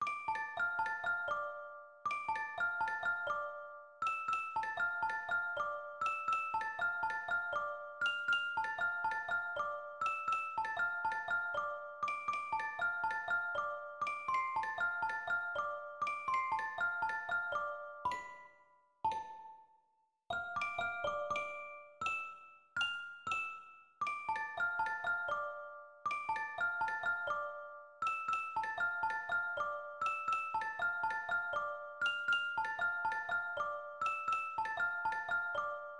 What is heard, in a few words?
Music Box